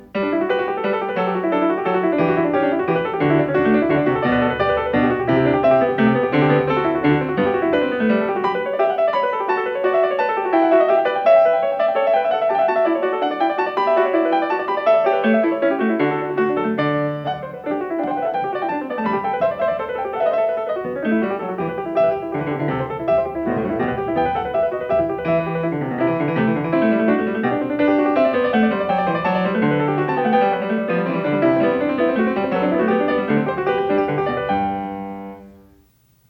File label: Variation 5 a 1 ovvero 2 Clav.; Bach; The Goldberg Variations